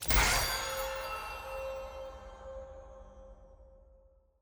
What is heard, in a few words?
ui masked button click; spirit blossom